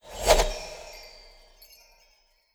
Magic Spell; Legend of Keepers

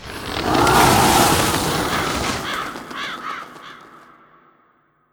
Crow

